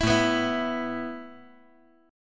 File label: A Suspended 2nd A